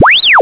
computer; bloog

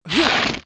ROCK

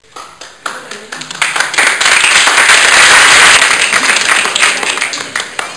Applause Only, Large Crowd; Soundsation; Human

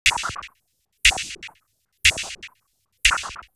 DRUMS, 120 BPM

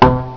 Twang; Blobbo 1.0.2 Lite

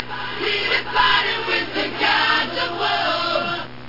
Reverse

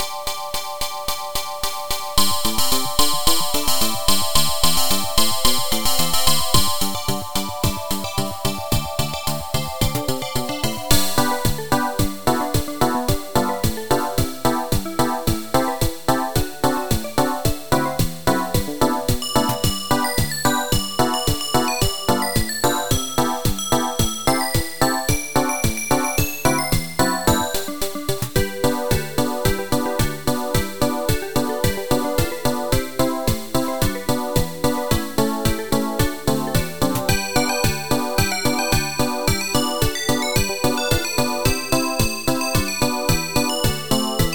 jingle; Educational Software Cooperative 4

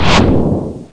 bazooka; Notrium

